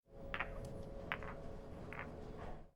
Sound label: pool table ball clacks